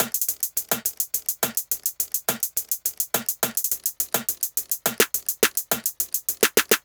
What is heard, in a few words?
140 Tops